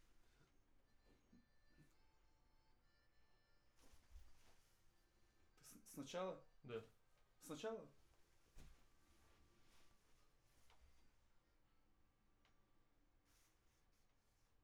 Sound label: vocal add condens